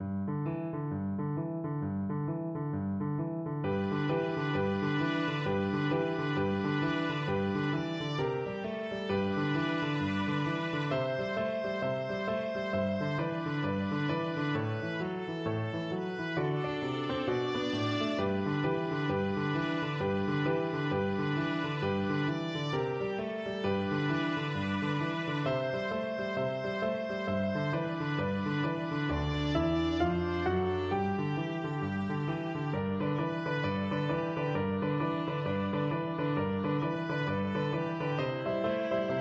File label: viola